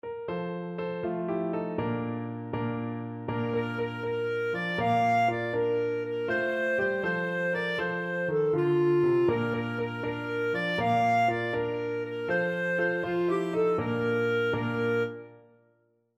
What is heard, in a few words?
mulberry bush, clarinet